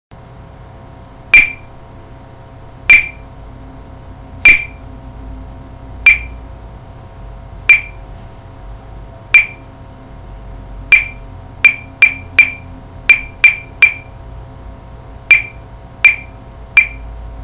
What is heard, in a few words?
perc